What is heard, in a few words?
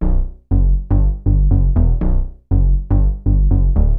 BASS, DANCE; MAGIX